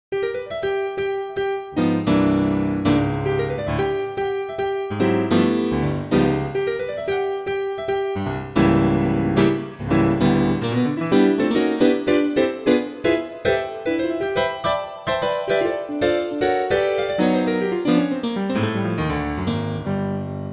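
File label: dynamic jazz piano power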